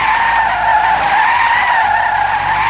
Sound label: slip; Mafie 1